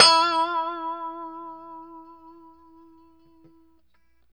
POP E 4, STRAT guitar; Spectrasonics; Hans Zimmer Guitars VOL-1; JC-STRAT POP

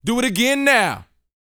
VOX F, Vocal Planet R&B & Dance HIP-HOP; ILIO

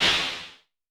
CLAP, Vermona Mono15 Drums

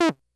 Bleep B, Drum Machines, Korg DS-10

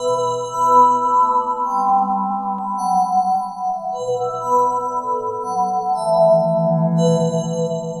Venera Melodic, Washes; Sonic Foundry (Sony Creative Software); Myths of Technology

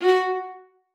F#4, VIOLA SPICC; Xsample VOL-5

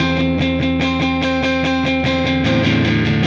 Silos Dirty Strum Guitar, Indie Rock

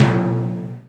PERC, Dance Mega Drums; Best Service; PERC-MIX

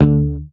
Bass, MUTES

